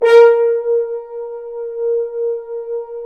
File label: BRS, FHns Accent; Roland L-CD702; Accent-Swell